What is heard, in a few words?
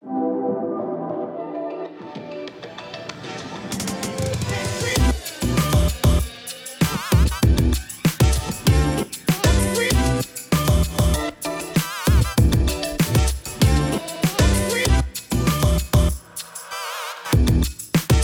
Trailer Music